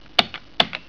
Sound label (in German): KLACK